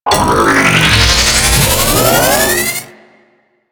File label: Beam Attack Charge; Enemies; Warframe; Vomvalyst; Teralyst; DE